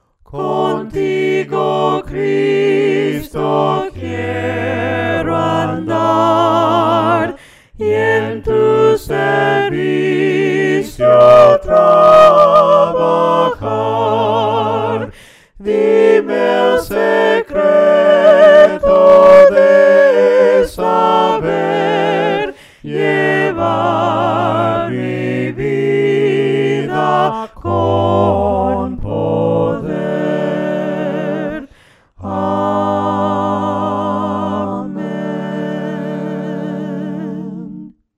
CONTIGO CRISTO QUIERO ANDAR VOCES, himnos a capella; cantos del camino

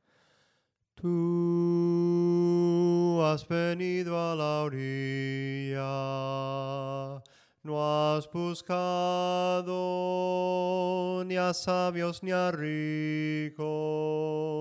SOPRANO CANTADO, himnos en melodia soprano

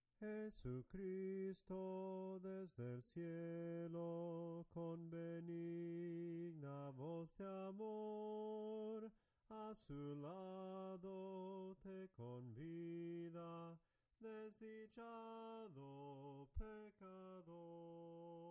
SOPRANO CANTADO, himnos en melodia soprano

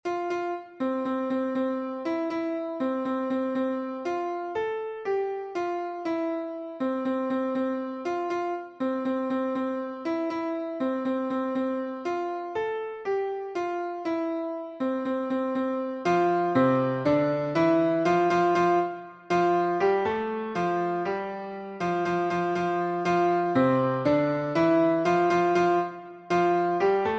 CONTRALTO, himnos en piano; 88 CANTEN AMEN AMEN; cantos del camino

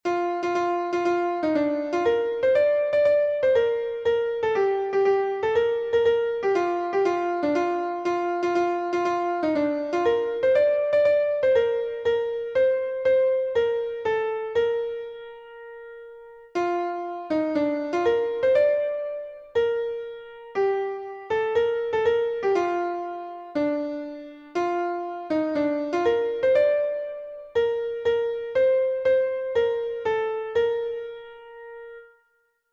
SOPRANO, himnos en piano; cantos del camino

